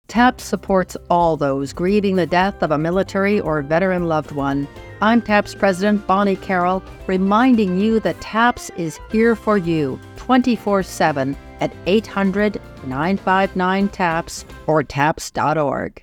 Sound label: TAPS long version